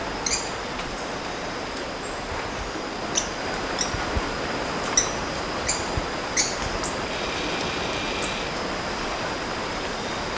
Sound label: bird